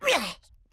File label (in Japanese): 攻撃